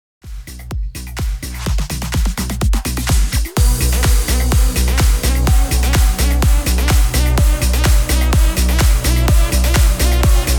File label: PROGRESSIVE CUT, 126 BPM